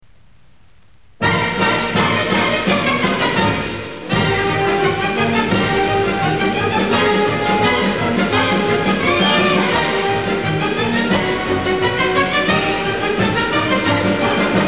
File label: Light Movement